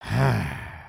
Sleep; creatures organic

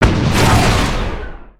Pocket Cannon

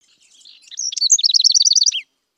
Jungle Bird

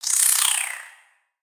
Spider Idle; creatures mechanical; Lego

